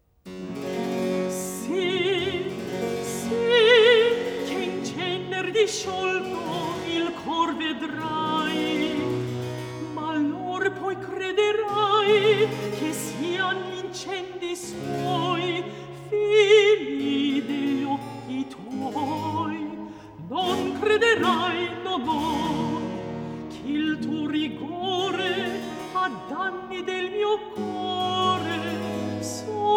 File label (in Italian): Recitativo; Piango, sospiro, e peno; Sì, sì che in cener disciolto il cor vedrai; Scarlatti; Cantatas